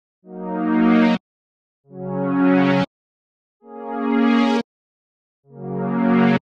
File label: rev strings